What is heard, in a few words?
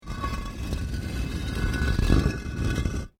Brick Medium Hollow Move